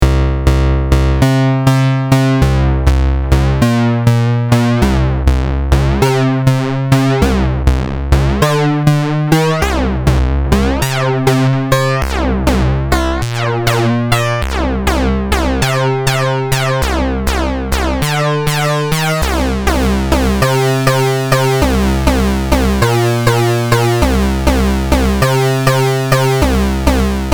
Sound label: behringer pro-1 hard sync